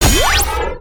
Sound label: big ball pickup, ui; aronwk collection; ndaudio